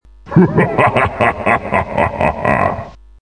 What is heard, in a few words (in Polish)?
smiech; cstrike